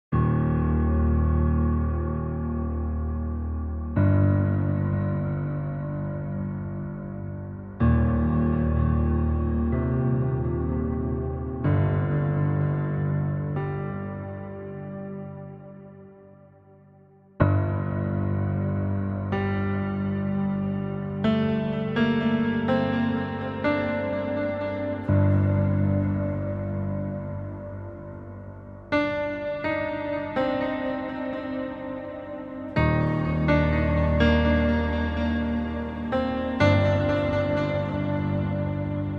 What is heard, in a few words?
Piano